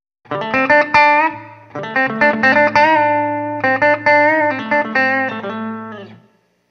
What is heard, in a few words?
Blues Bridge, pickups; Dallas